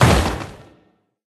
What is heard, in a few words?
Attack Impact; Hero Beresad